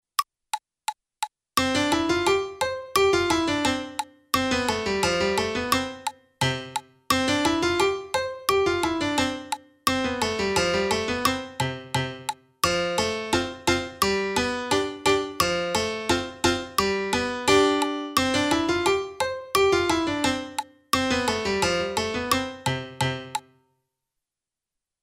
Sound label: Dynamic Etude, pianist